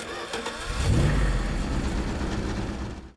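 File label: exterior start, Vehicle; NASCAR Thunder 2004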